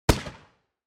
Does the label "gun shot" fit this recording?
Yes